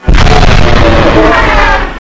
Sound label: blast; sven; trempler; sun of god